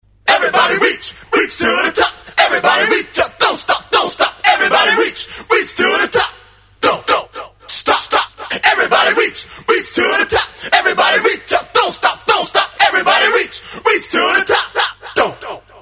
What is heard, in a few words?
Acapella